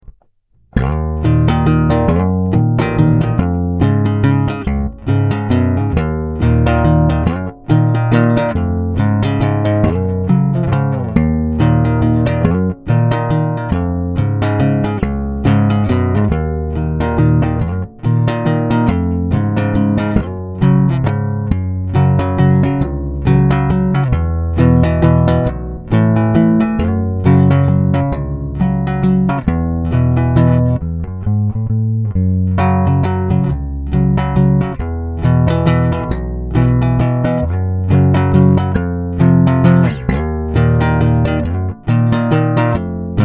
bass sound sample neck, bass guitar; shishido

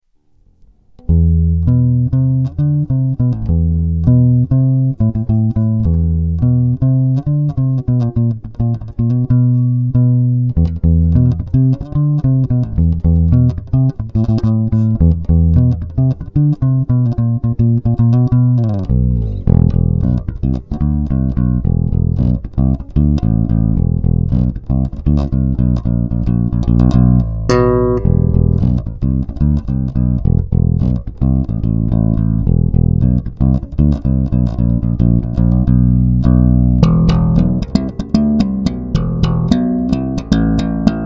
bass sound sample passive, bass guitar; weigraff

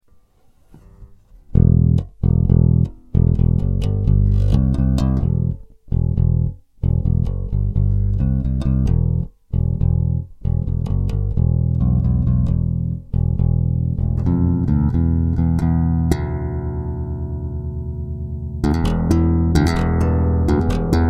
bass sound sample half eq, bass guitar; weigraff

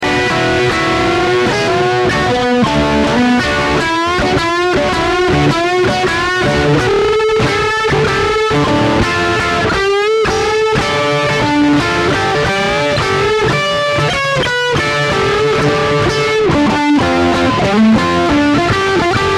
guitar rock sample, bass guitar; cephisso

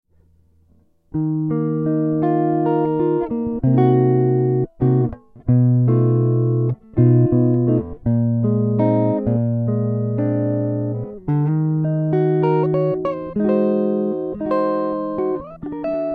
guitar sound sample neck, bass guitar; aurelia

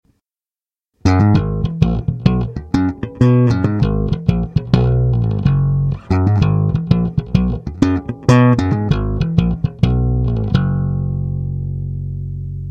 slap tone high action, bass

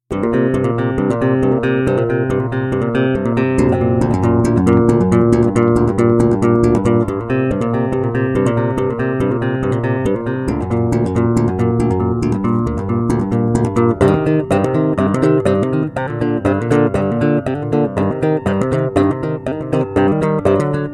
bass sound sample neck; jormungandr

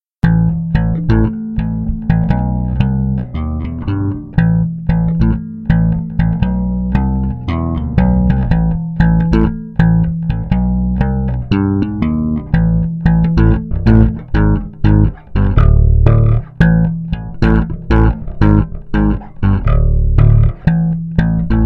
bass sound sample blend, bass guitar; sanjuro

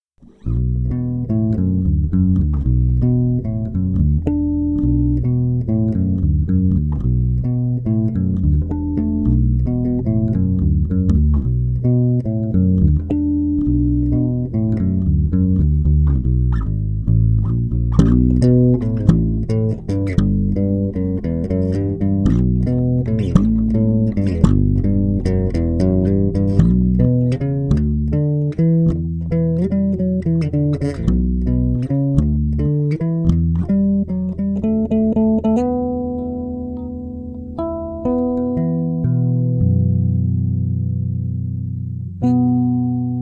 bass sound sample blend, bass; jormungandr

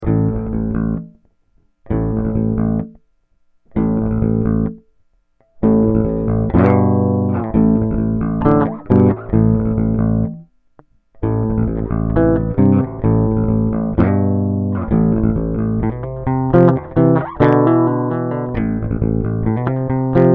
bass sound sample bridge, bass guitar; shishido

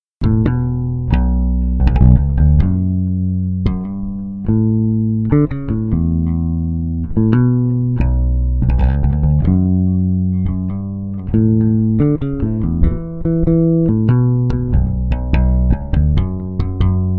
bass sound sample blend, bass guitar; sanjuro

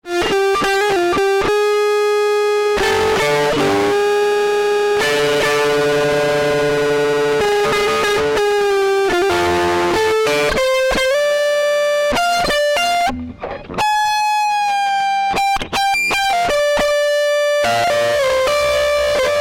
guitar sound sample neck, bass guitar; aurelia

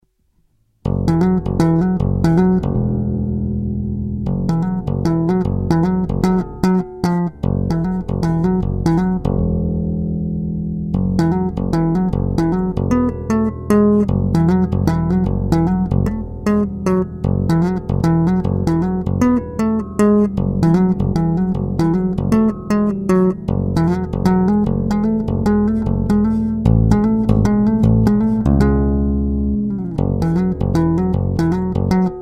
bass sound sample half eq, bass guitar; weigraff

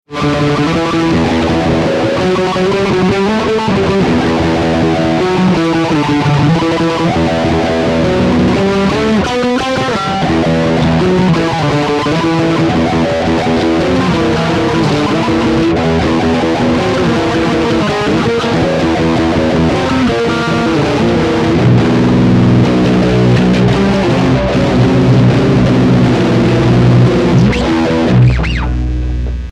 guitar metal sample, bass guitar; cephisso

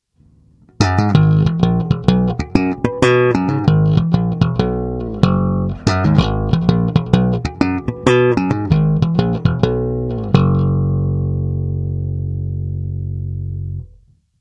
slap tone low action, bass

